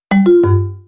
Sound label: end session sound effect